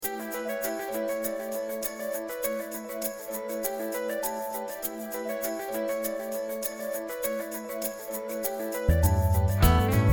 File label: tune in A